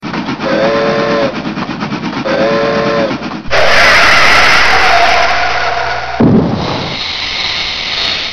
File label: car vs train